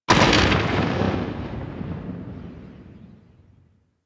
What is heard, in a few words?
TNT Explosion; DE; Warframe; Weapons; Archwing; Powers